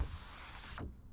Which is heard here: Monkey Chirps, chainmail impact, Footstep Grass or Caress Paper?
Caress Paper